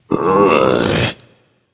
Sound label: ugh; smite; voicepack; guanyu